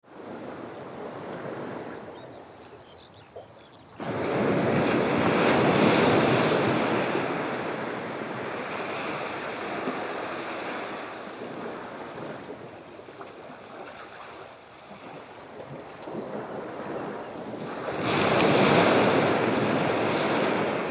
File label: atlantic ocean